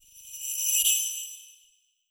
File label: sleigh bells reverse no echo